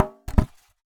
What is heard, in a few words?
Polystyrene Rattle